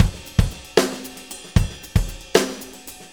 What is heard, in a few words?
Jazz Rock, Drum Tools, Various Loops